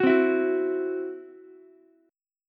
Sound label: E Suspended 2nd Sharp 5th, Ukulele; Esus2#5 strummed; 11,12,12,9